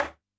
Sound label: TABLA, PERC